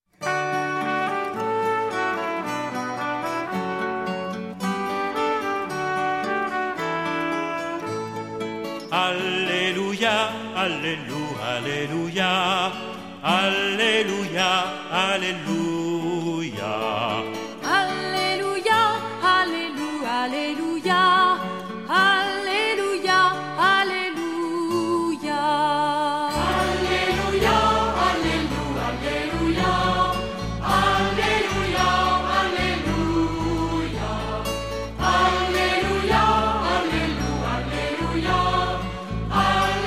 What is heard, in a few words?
Alleluia Taize